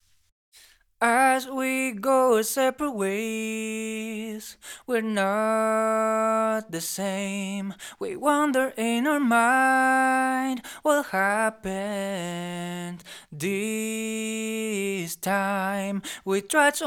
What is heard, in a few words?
Pop EDM Dance 116 FM